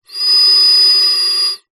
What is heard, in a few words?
foley air blow inside small tube pipe whistle recorded at opening